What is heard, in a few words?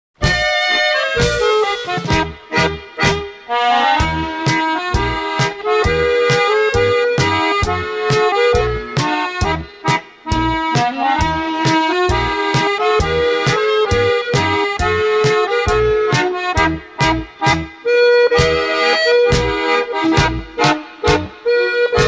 polka, instrumental